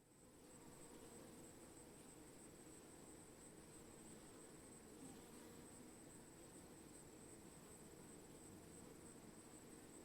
swarming bees

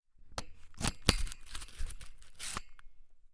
object grating metal

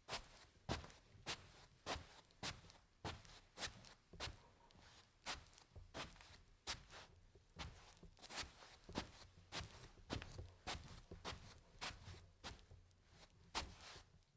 slippers shuffle on carpet

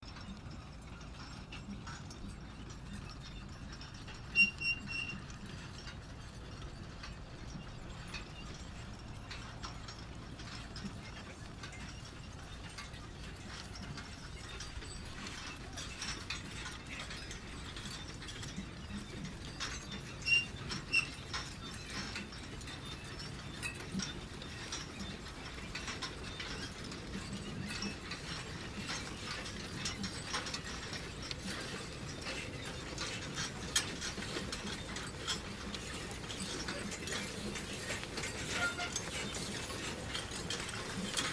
manure spreader

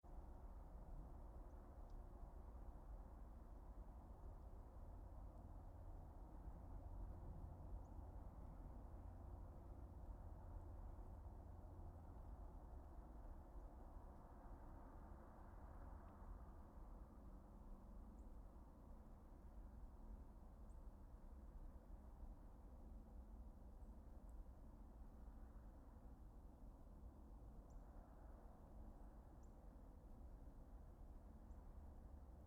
distant highway

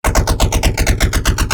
machine gun hit car

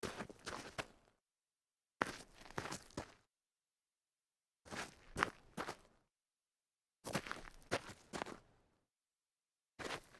walk turn grit

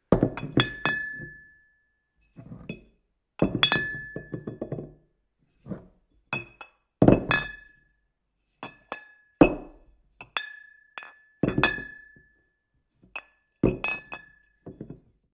wine bottles clinking

